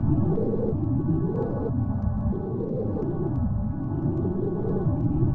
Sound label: Bright Moon Cottage E Ambient